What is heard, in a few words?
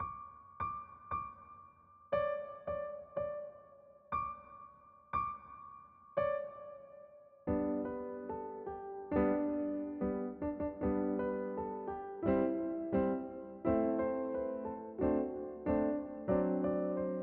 Jingle Bells